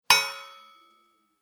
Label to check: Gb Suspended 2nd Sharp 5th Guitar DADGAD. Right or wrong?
Wrong